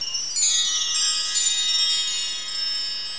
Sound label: enter